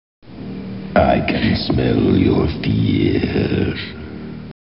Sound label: fear, spooky sounds